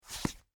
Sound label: Female Boots Scrape, Footsteps, Female, Boots, Scrape, Hard, Wood, Surface